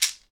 SHEKE, Afro Shakers, Shekeres

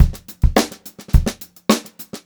BEAT, Funk Groove Drumloops; USB Soundscan vol.38; AKAI

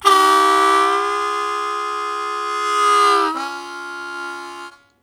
Blues Chord Attack C#1, Harmonica; 90 s Sample CDs; Zero G

